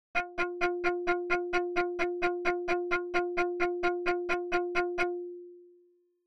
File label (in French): Sautille